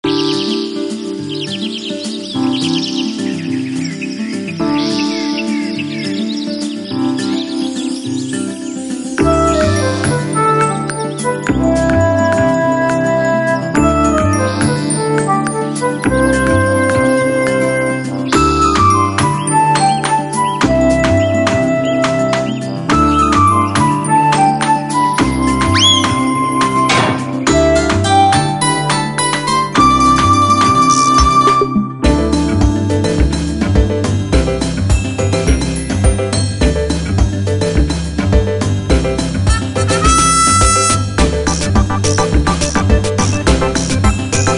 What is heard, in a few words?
bgm morning